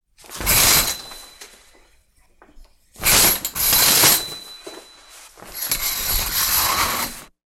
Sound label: household shower curtain pulled 4 times; sound effects one